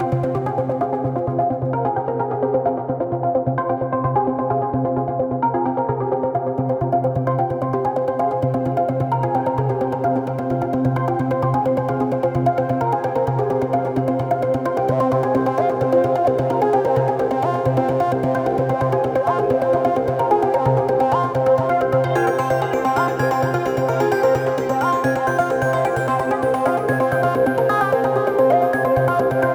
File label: melodic enchantment